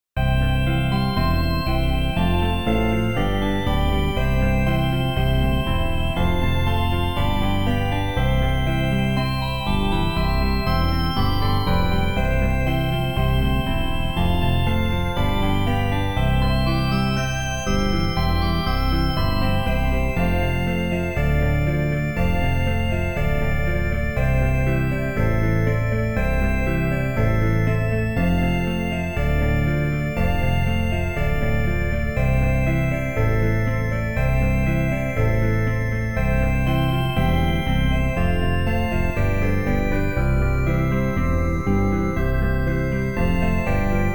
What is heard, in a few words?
backing; New Hope Music